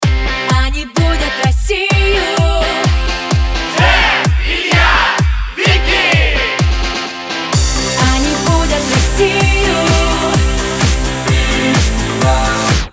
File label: Jingle Main ID; Europa Plus; Brigada U